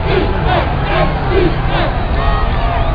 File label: chant; ncaa